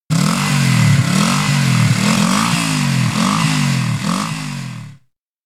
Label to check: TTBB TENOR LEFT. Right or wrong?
Wrong